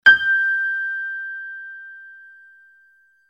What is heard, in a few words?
piano G